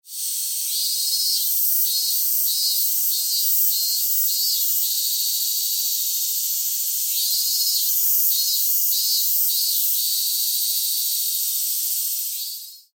ミンミンゼミ

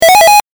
8bit 獲得

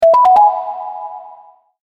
完了

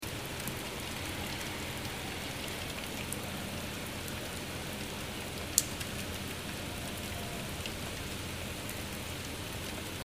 雨音ループ

